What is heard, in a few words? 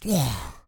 Zombie Death